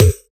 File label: Percussive